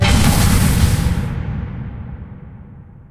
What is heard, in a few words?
slow crusher close end; Source Engine; Portal 2